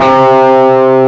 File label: C2, GUITAR; AKAI S-Series CD-ROM Sound Library VOL-8